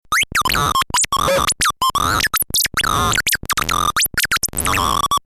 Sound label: bleep tone